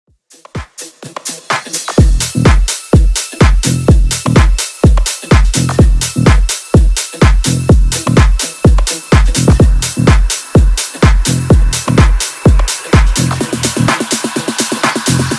Edm Mash up

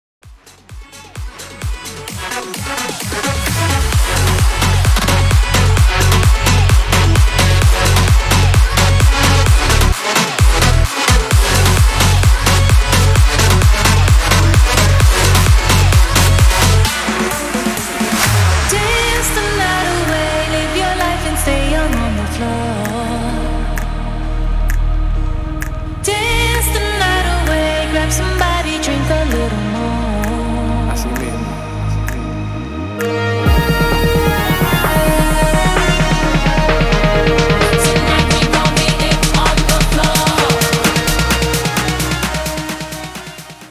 BIG ROOM 130 BPM

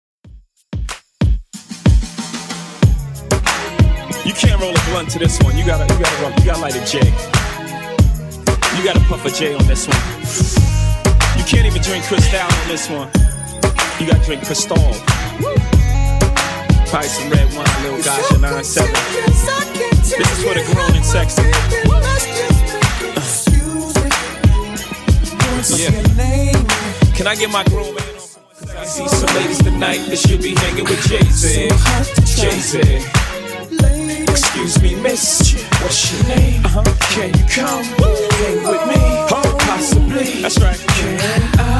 Throwback Rnb Redrum 93bpm

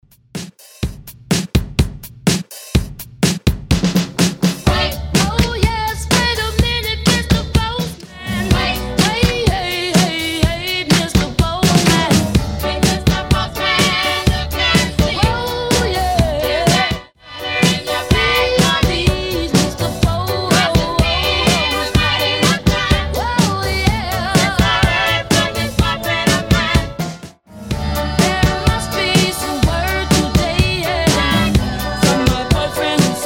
50s Redrum 125bpm

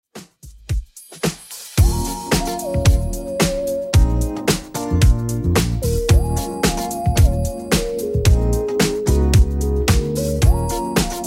90s Redrum, 111 bpm

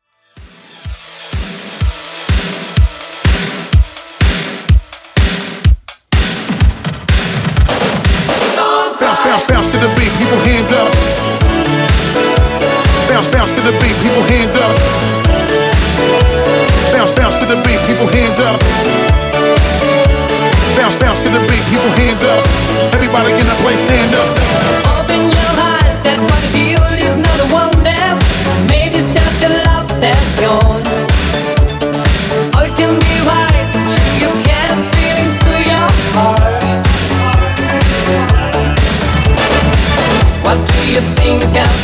80s Hype ReDrum Mix 125Bpm

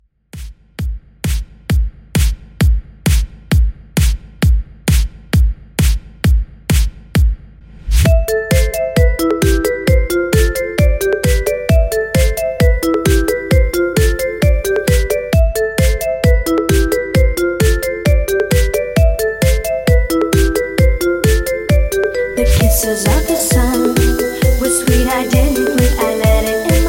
Throwback Redrum 132Bpm

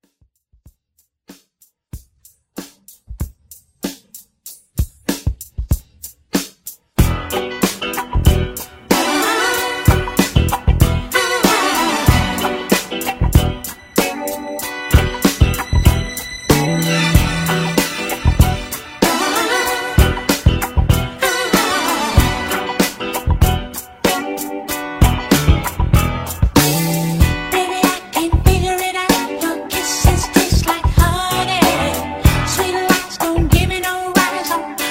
70s Intro Beat

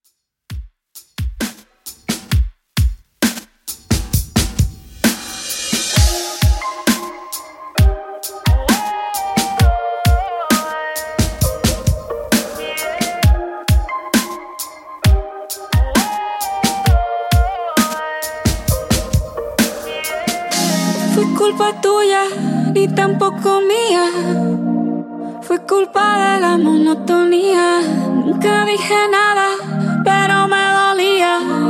Reggae Redrum 132Bpm

